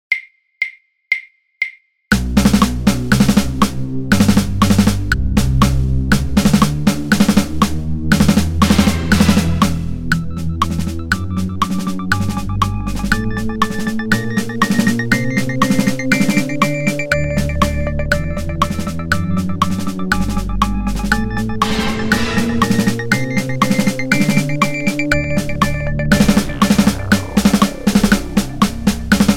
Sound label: snare drum synth click 120 bpm